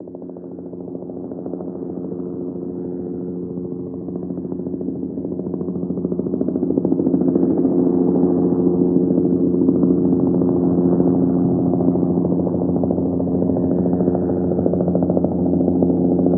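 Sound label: heli flyover interior; ModernWarfare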